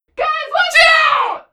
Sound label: doppler vo, train; Portal 2